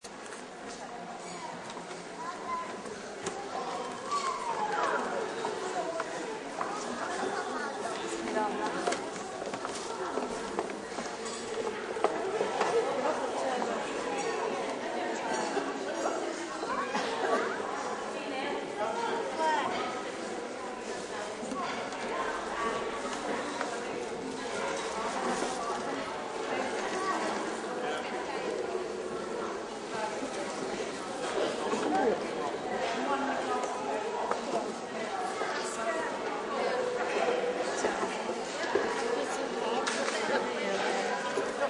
Indoor Market Ambience, Market ambience. Small indoor market in town hall. Stalls and; Shoppers